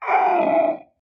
dinosaur grunt

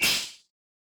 snake attack; soundscrate

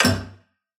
robotic click; soundscrate

